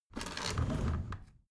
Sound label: window roller shutter open soft single pull